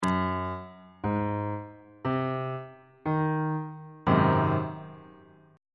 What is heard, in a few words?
a flat dominant 7th 3rd inversion